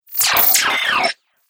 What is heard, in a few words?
Science Fiction Laser Activation; Laser Sound Sound Effects; Sci-Fi Laser Sound Effect